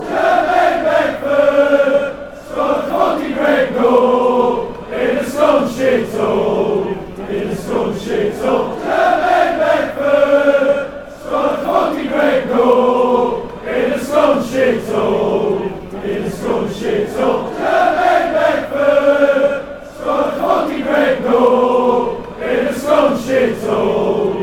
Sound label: scored a great goal, fanchants; jermaine beckford